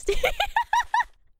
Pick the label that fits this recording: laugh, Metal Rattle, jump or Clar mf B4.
laugh